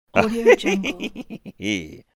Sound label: male secret traitor laugh; fxprosound